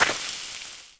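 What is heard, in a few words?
lava step; generic; ambient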